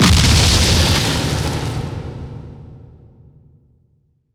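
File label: bomb explosion; wob2 demo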